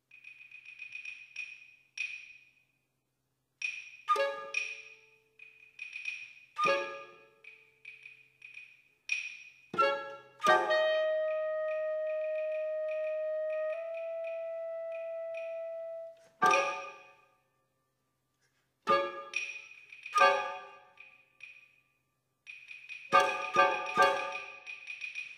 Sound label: Crickets and Night Winds; Gisela Scott